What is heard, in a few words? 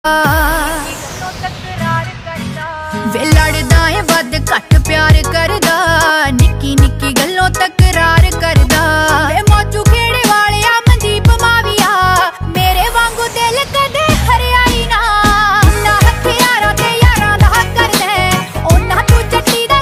Dance Punjabi Ringtone